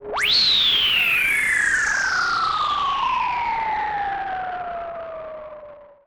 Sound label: Roland E Noise, Roland S100; musicradar-synth-samples